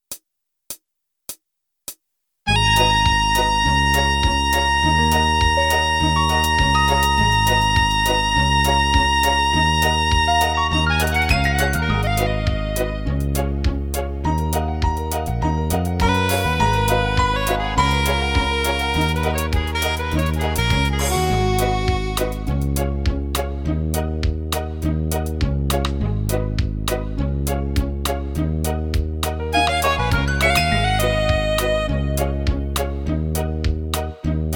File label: brass minus